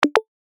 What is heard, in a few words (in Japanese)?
メッセージ